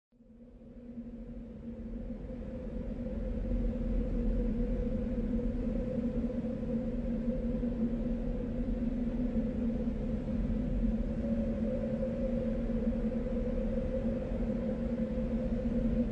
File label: desert